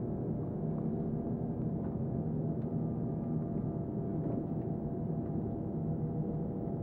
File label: ambience small